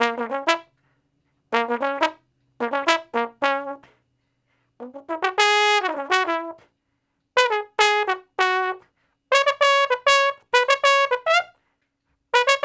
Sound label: Trombone Straight (Db); Sonic Foundry (Sony Creative Software); Horncraft for R&B; Solos